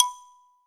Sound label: BALAFON B4, Africa